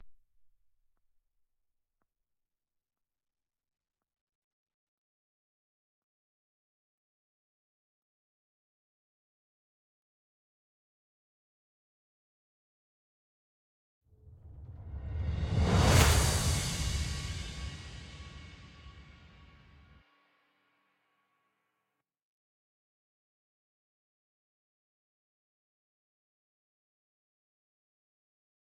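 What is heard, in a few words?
Real Percussion Phrase; Worms Rumble; PS4; Xbox Series X/S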